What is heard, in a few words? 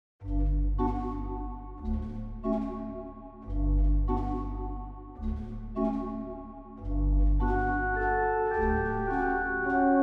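Organteq Dynamic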